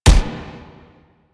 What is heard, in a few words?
Alt Punch; Weapons; Warframe; Fulmin Prime